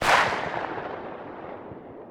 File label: echo